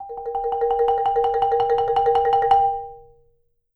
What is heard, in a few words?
Marimba roll D#4; Sampleheads - Dave Samuels Marimba & Vibes